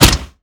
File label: Aircrafts Engines Packard Merlin Prop; DCS